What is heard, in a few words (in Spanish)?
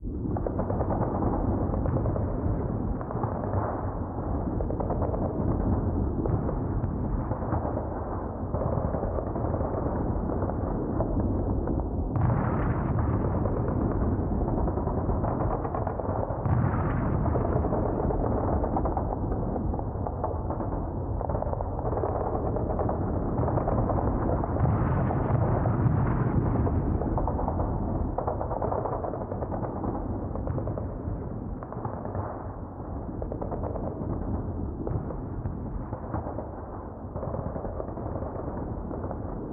music loop fondo